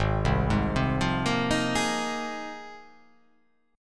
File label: G sharp 6th Add 9th G sharp